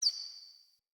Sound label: Cardinal Female Bird Single Chirp